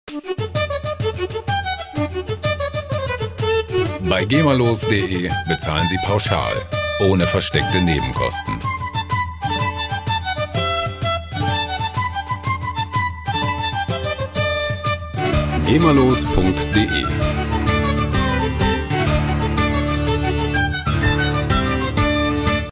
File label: irish jig